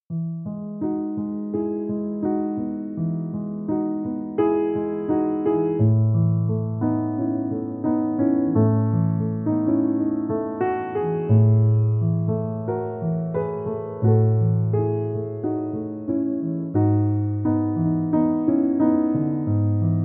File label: Jazz For Sleeping